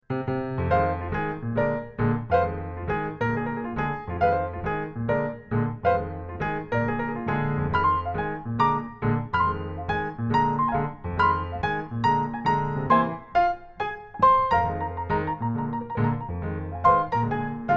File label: groove